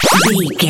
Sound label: retro powerup collect